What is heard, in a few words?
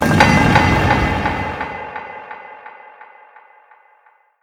key pickup; svencoop; morass beta